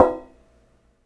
metal collision mid; The Swapper

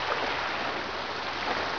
shore; Caesar 2; DOS

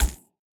ball bounce shock; CrossCode; battle

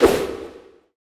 dash strong; CrossCode; battle

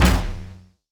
hit wave medium; CrossCode; battle

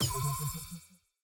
highlight switch

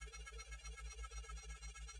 platform riding

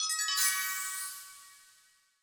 medal get; CrossCode

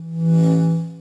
soft alarm

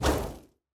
throw ball heat; CrossCode; battle

